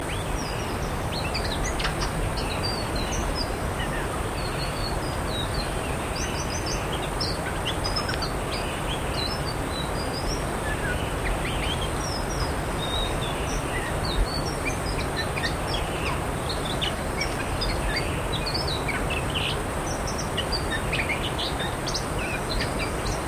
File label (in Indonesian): ciung ngeriwik